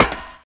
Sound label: player grate